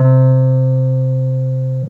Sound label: C near the neck